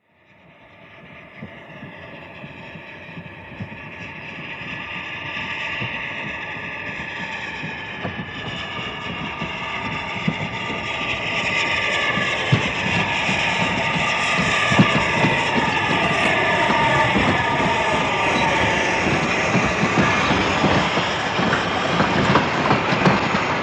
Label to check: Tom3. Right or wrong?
Wrong